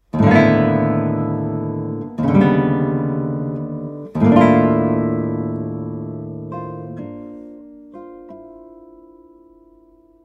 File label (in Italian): Adagio, Liberamente come improvvisando; Simone Fontanelli ( 1961)